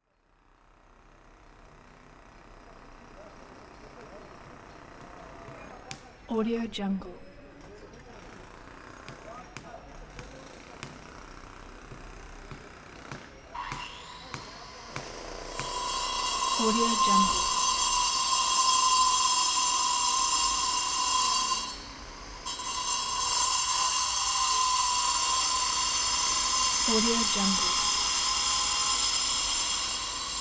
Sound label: construction ambience with cutting pavement tiles